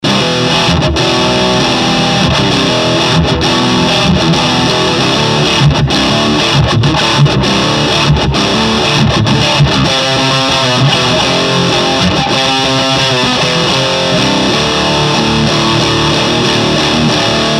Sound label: ad50vt numetal; vox